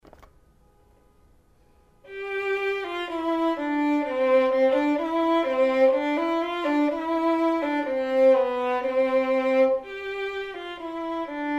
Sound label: perf vln; Building Technique with Beautiful Music, Book 1 Violin